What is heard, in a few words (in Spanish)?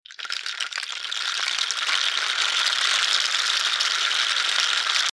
palo lluvia; reciclar tocar